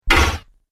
Sci Fi Impact; Tech Warrior

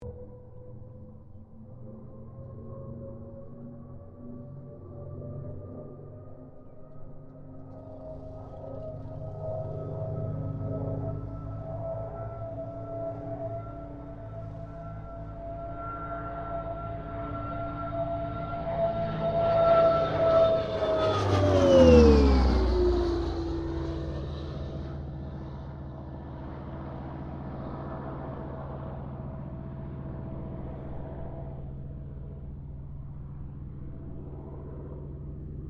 AIRCRAFT CA-13 BOOMERANG PASS STEREO

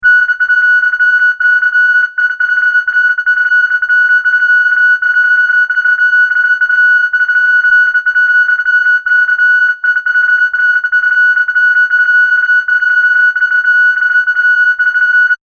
SciFi Scanner, Sci-Fi Scanner Sound Effect; Communication; Perfect for general Science Fiction usage or Multimedia usage; Communications; Crafted Signals

